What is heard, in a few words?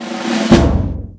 shell drum apply; Spearhead Demo; MacFormat 2003 June